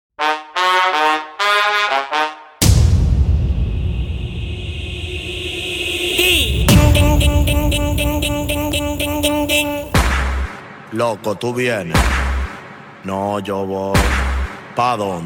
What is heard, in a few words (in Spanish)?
dembow bpm 120